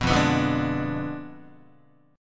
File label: F Diminished 7th F down Guitar; 2,1,2,0,0,1; Fdim7 strummed; JGuitar